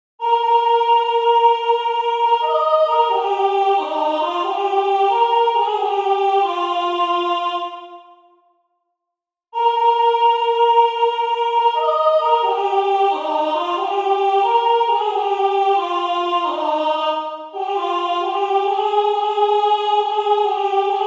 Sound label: Training Soprani